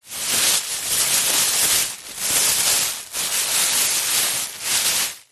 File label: foley plastic bag thin empty movement rustle handling long